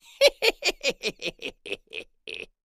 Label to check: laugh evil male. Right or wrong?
Right